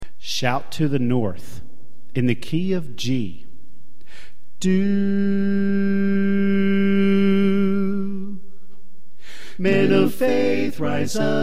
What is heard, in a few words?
Alto Left